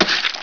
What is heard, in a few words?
poison; Exile III v1.0